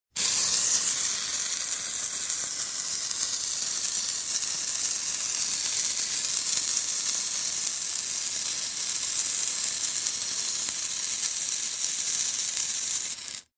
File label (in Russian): bigfordov shnur